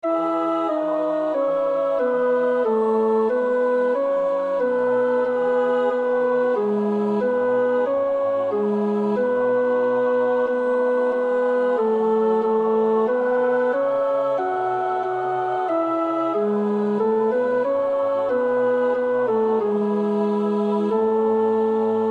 tenor, koorpartij oefening; Stainer (John); The Crucifixion

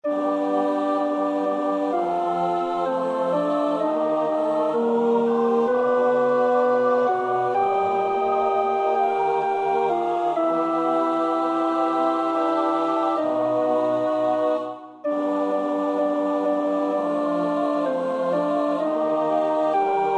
alt, koorpartij-oefening; Witt (Robert William); Four Motets to the Blessed Virgin Mary, opus 29; II. Ave Maria

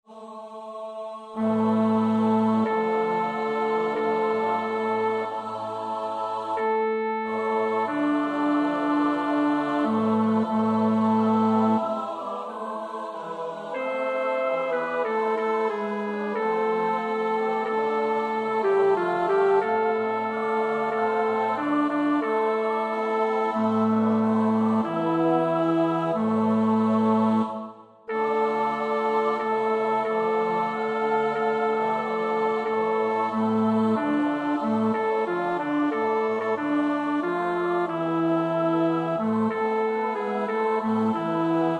bas 2, koorpartij oefening; Weelkes (Thomas); Hosanna to the Son of David

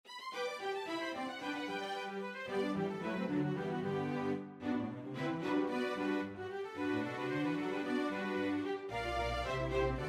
alt, koorpartij oefening; Poulenc (Francis); Gloria

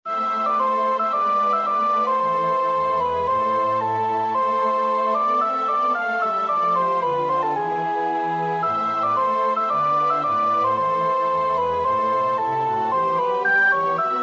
sopraan, koorpartij oefening; Purcell (Henry); King Arthur

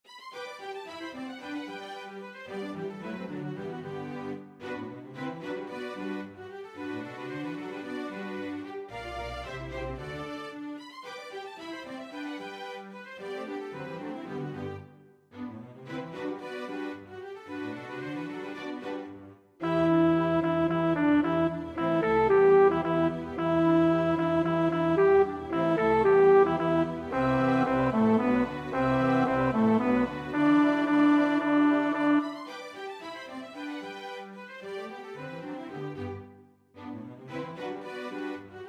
bas, koorpartij oefening; Poulenc (Francis); Gloria

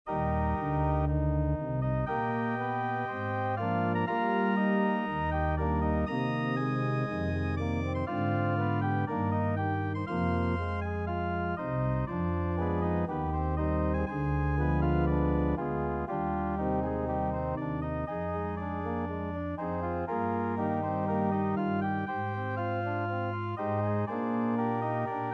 sopraan 2; Parry (Charles Hubert Hastings)

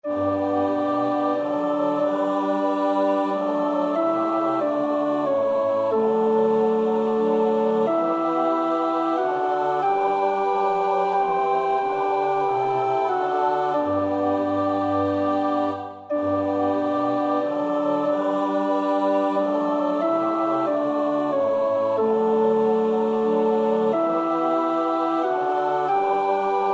alt, koorpartij oefening; Bach (Johann Sebastiaan); BWV 070

